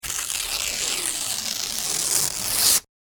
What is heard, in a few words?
Tearing Paper; Paper Sound Effects